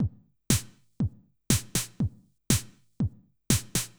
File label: House Drum NoHH Reverb